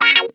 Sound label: WAH, Funk Guitar; Zero G - Funk Guitar